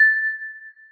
celesta, programmable speaker

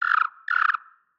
world tree birds day; Factorio

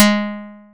plucked, programmable speaker

